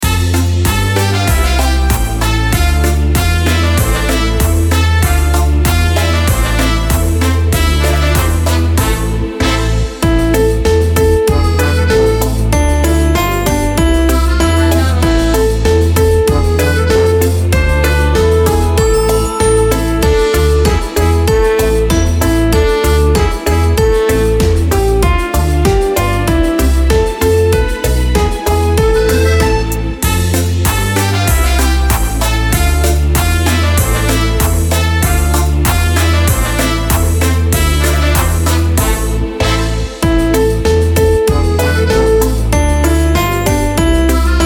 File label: karaoke